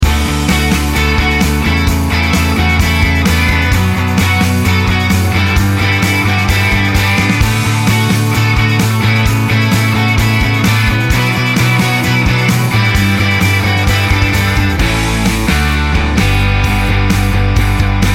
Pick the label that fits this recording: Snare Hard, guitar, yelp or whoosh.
guitar